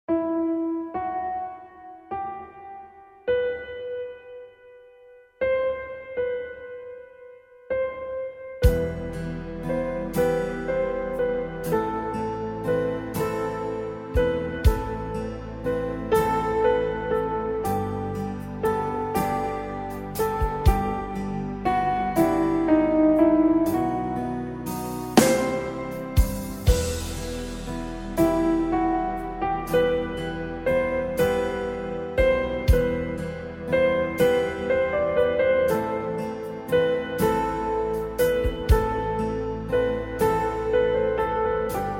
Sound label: Piano